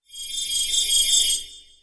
Attack; Shua

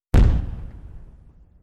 Fire works single

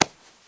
Fishing catch

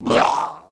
Lizard voice damage

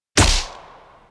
skill deathblow attack

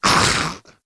voice volley; Lizard

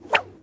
Fishing stick back

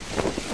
ui mouse click

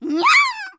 voice chargeshot; Pochi

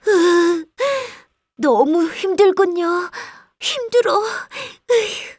lose point

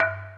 DING G4

